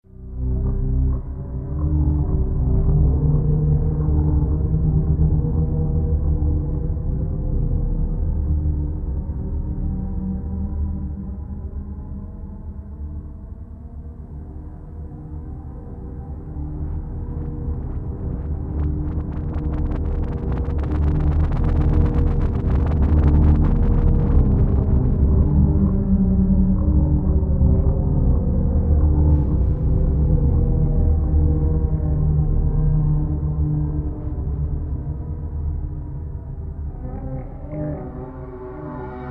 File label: Scary Sound; Scary Sound FX Clip; Americn Horror Story